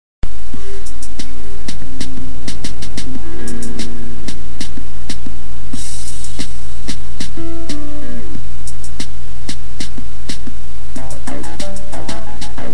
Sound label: beat